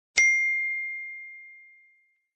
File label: message